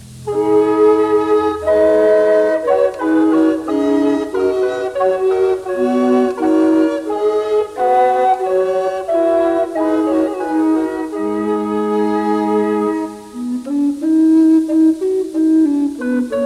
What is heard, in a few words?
Song of Mourning; Camden; Ps 137